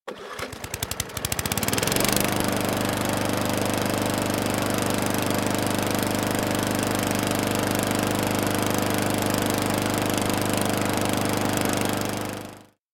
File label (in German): Rasenmaeher Benzin